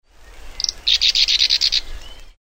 whinchat

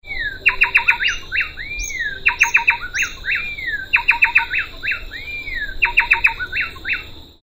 coraya wren

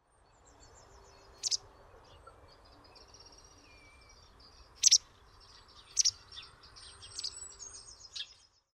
pied wagtail

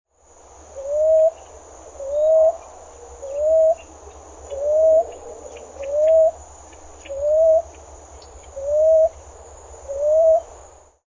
common ground dove

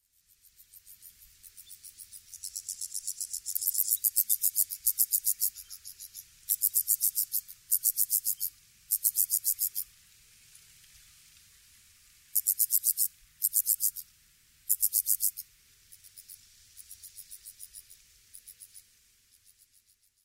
heath grasshopper

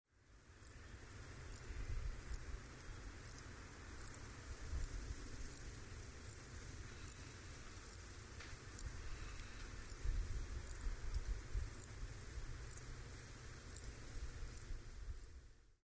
phaneroptera nana

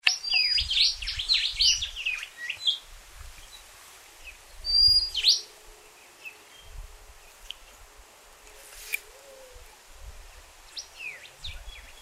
rufous whistler

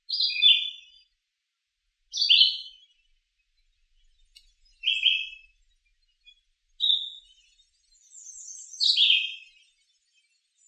philadelphia vireo

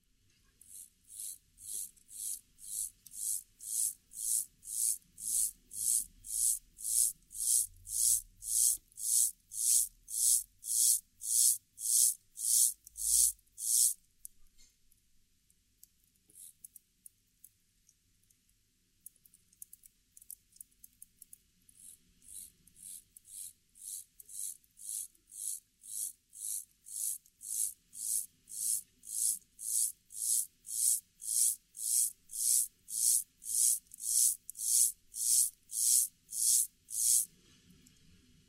mottled grasshopper

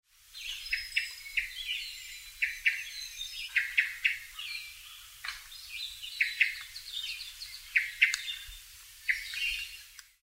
hermit thrush

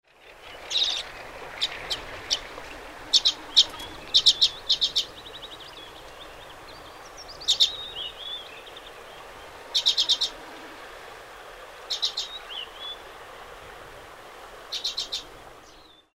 arctic redpoll

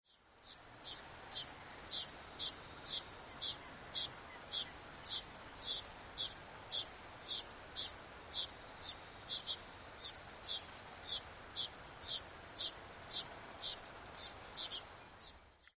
serin

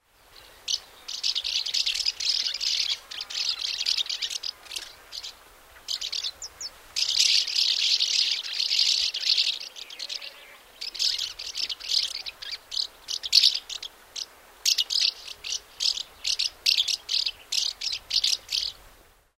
house martin

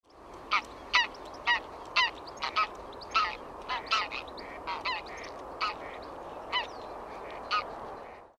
greylag goose; Calls from a small group in flight.

